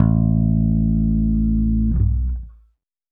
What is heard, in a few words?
Bass Ending, Indie Rock; Sonic Foundry (Sony Creative Software); Indie Rock Anthems; Session 10 - Roswell